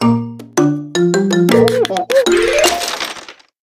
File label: jingle